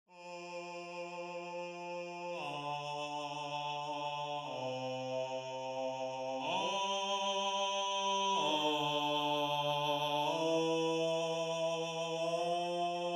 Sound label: Bass